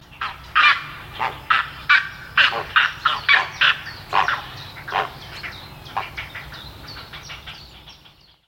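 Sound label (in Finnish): harmaahaikara, lintuäänet; Kosteikot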